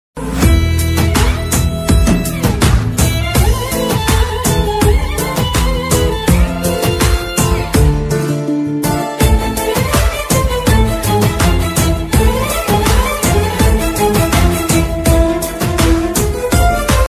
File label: romantic ringtone love ringtone; pelli sandadi film